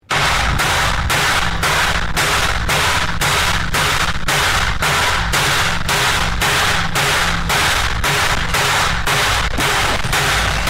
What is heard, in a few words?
farming asmr